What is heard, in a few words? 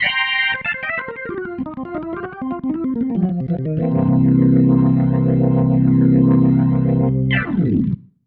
Ending, Organ; Sonic Foundry (Sony Creative Software); Joe Vitale Organ Donor; Endings